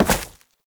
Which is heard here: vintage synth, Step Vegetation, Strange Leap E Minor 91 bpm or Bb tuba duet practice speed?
Step Vegetation